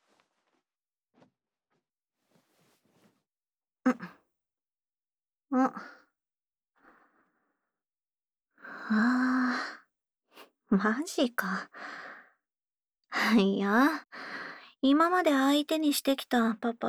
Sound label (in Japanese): 耳舐め 素股 手コキ